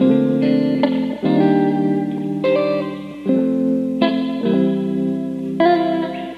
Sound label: guitar